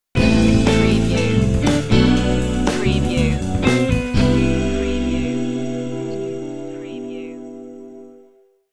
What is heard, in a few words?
funky strut stinger